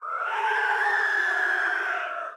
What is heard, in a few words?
Death; Likho